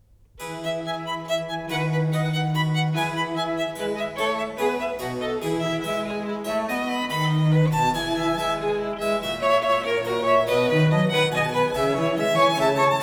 Trio Sonata For 2 Violins And Basso Continuo No.4 in E major; Vivaldi